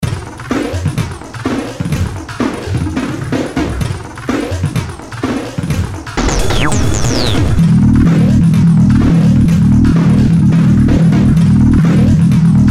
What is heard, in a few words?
Drum n base Acid